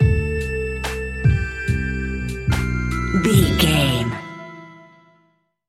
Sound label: dreamy floating lofi stinger, A relaxed and chilling piece of music; great for light documentaries, background music for tv shows